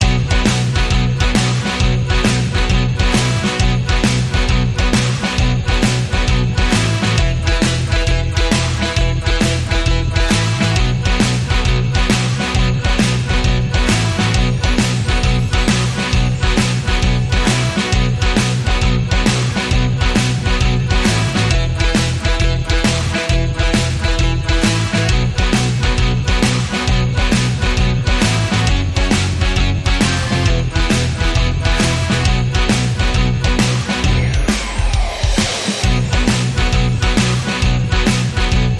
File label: Rock n Roll Christmas, No Melody